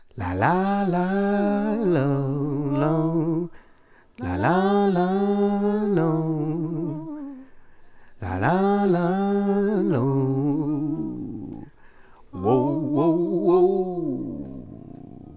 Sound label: mixture, singing librispeech; MedleyVox